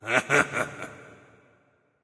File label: heh heh; smite